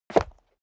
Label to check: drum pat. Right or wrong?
Wrong